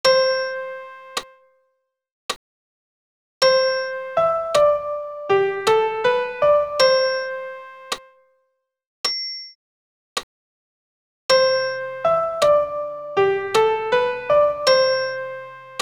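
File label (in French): Sanctus soprano piano seul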